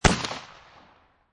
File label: Shot Gun Mossburg 500 12 Gauge Single Shot Exterior At 45'; Exterior, at 45 feet distanc